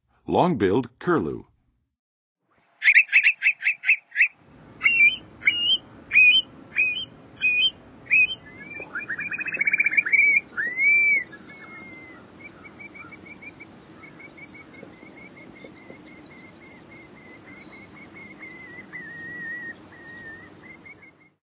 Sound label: Long-billed Curlew, Birds of North America; Western USA; Western USA Songbirds